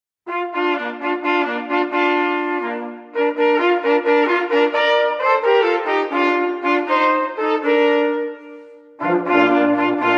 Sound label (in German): Jagdmusik